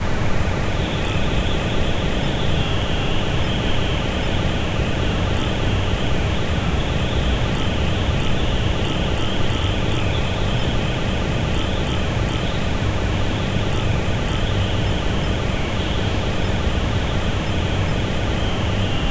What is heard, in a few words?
castle ambience